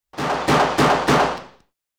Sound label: Pistol Fire; KMP Film Video Sound Effects